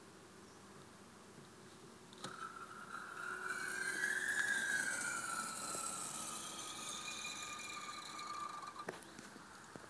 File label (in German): gasdruckfedern 650N